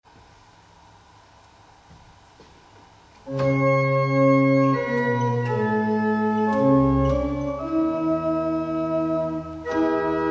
Double Chant, barrel organs; Tittleshall

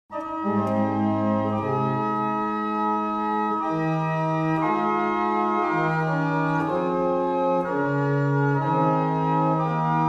barrel organs; Tytherley; Acton

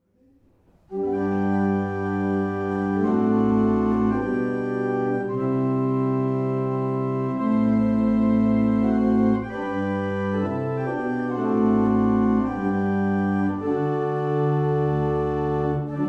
Double chant, barrel organs; Quantoxhead

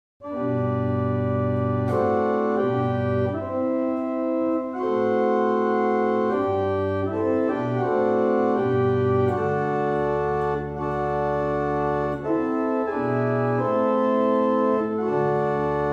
Double chant, barrel organ; Brightwell

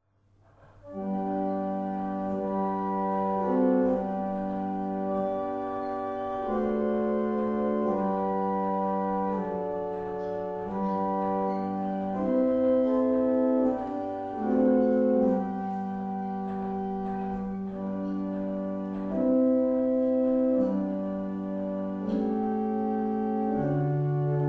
Abingdon, barrel organs; Sutton Beds

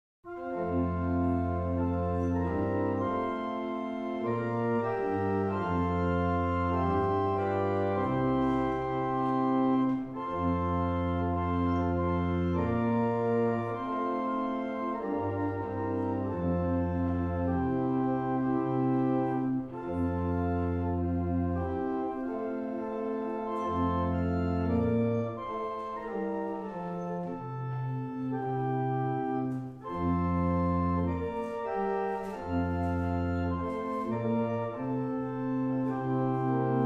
barrel organs; Stanstead

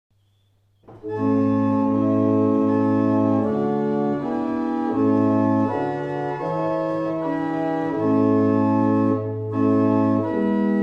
Oxford New, barrel organs; Mattishall

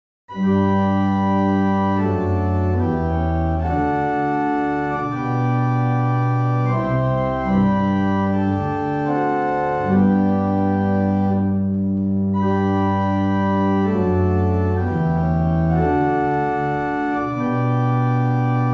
Single chant, barrel organ; Easton

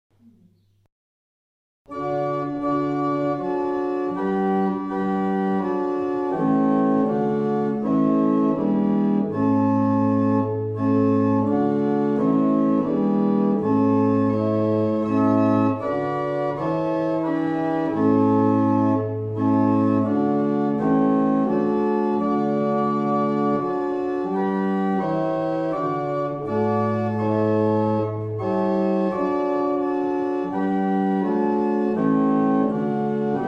barrel organ; Mattishall

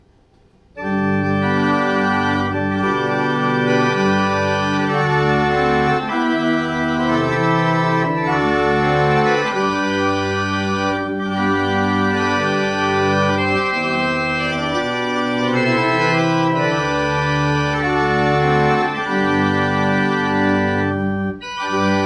Single Chant, barrel organs; Thomas Purcell

